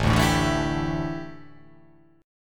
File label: A 7th Suspended 4th, A, down